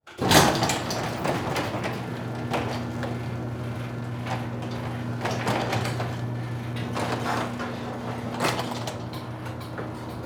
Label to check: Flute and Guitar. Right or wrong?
Wrong